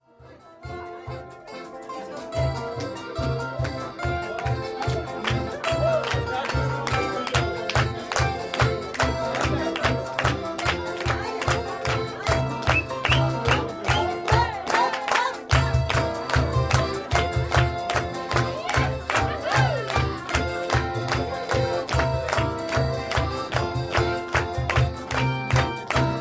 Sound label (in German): Polka ausschnitt